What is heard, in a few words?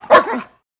Dog Bark To Snarl